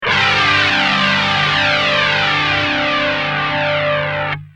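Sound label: guitar; mxr psd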